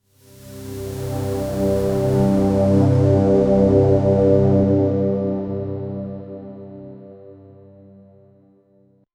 synthwave outrun synth pad

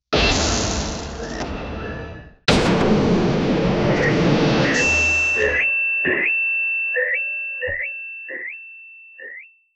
fast wind rush metallic; voiceover

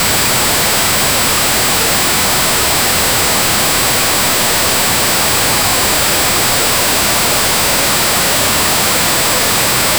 white noise

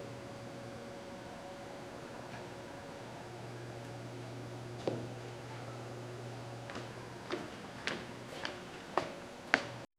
indian hostel corridor at; indoors

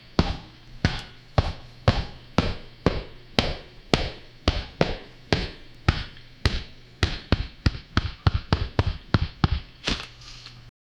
a realistic and intense s, gym

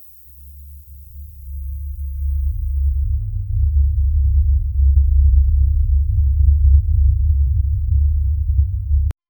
deep low djembe drum; ancestral; SFX Engine

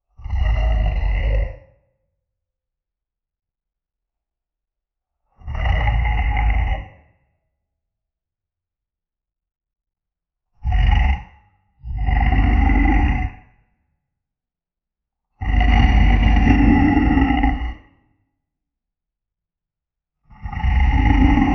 swamp monster growl

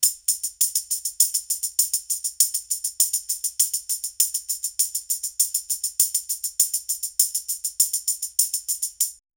flute and soft tambourine

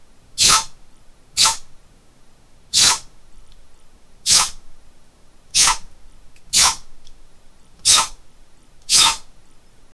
straining effort sound

